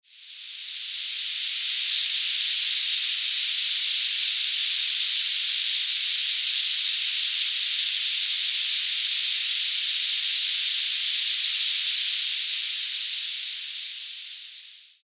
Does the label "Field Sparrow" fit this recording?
No